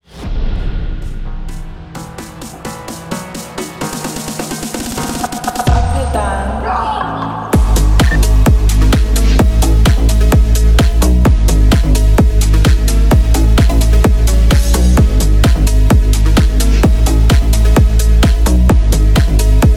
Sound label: BEAT